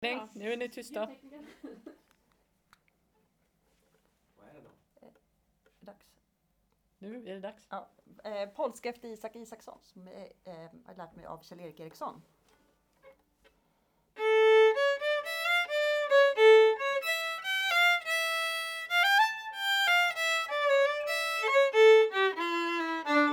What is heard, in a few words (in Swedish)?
Polska